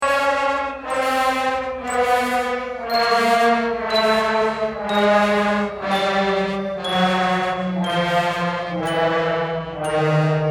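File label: trills-march, brass band; Donaueschingen Musiktage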